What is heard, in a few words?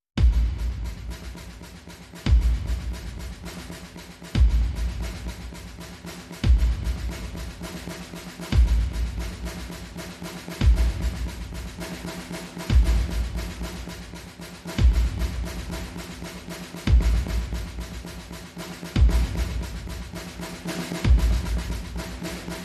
Drums and Bass Mix